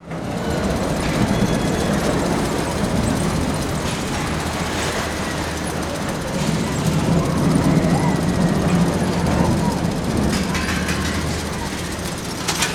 roller coaster ambience

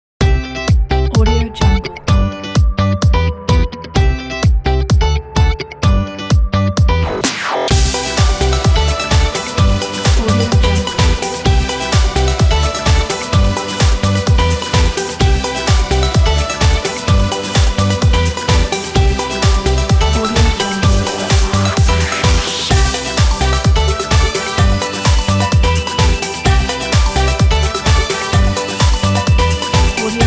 summer positive pop

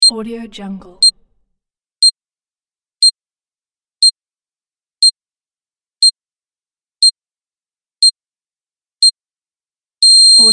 bomb countdown timer

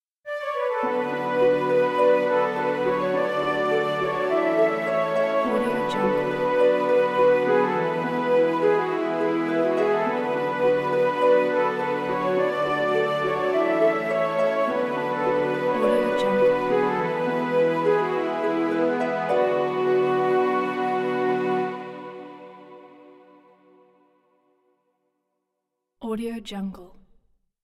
elf and unicorn logo

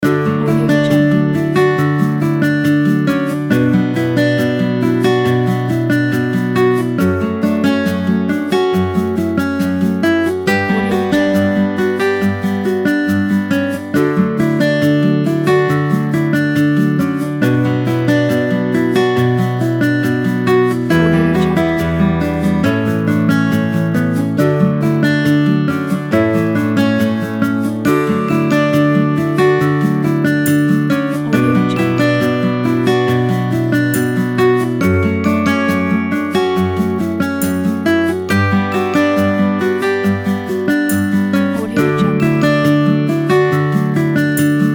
romance

